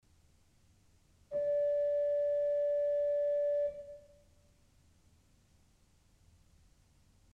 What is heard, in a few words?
Principal 4' d1, ORGEL pipes